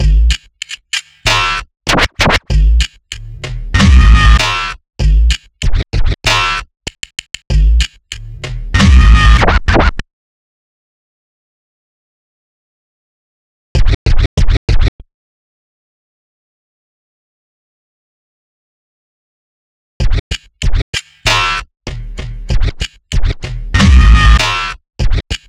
scratches 192

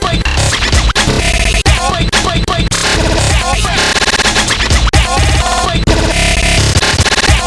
BREAK sample 128.2

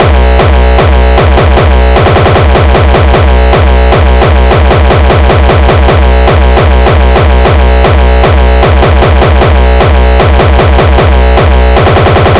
B kicks, breaks

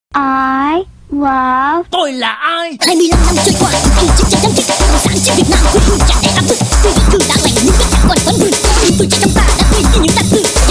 Snares - Breakcore; Vietnamese

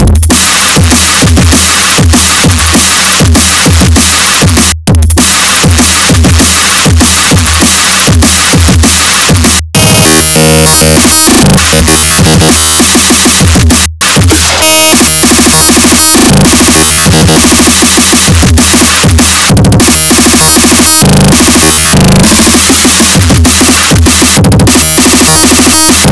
dnb 197

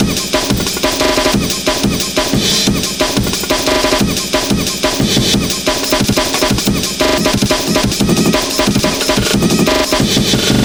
break 180

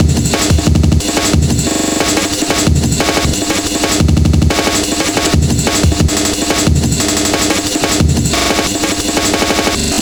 break 180

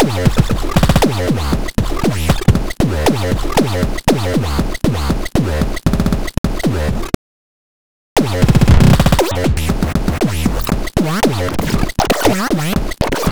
kicks 235.1 A

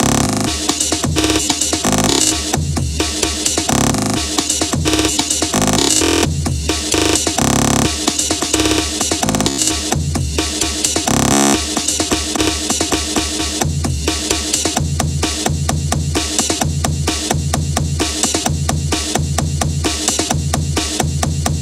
anguish breaks 130

